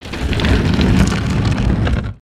Pillar Crumble

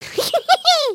water ride; emotes

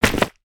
Stink Bomb Fish Flop

